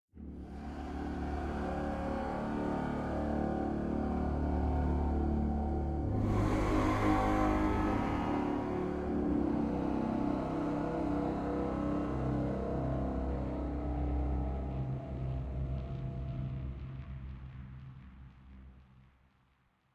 stinger; HDF Ch2 WelltoFoyer

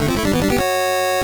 Mono Stereo 1up

